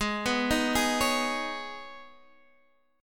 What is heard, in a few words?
Eb 7th Suspended 4th Sharp 5th, Ab